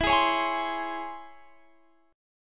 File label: Db Minor Major 7th E